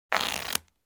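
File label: shuffle, cards; Bicycle Casino; XBOX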